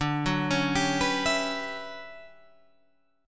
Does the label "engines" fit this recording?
No